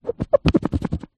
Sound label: office marker rub out erase whiteboard